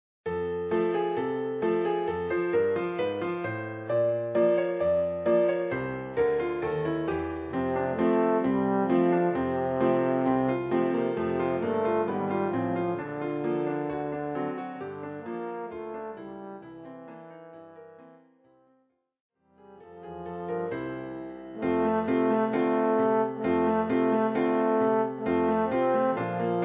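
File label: trombone, für Posaune und Klavier; Jingle Bells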